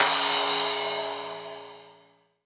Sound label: Crash, Cymbals; Vengeance Samples; VEH2